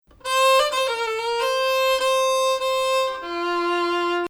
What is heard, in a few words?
Ajam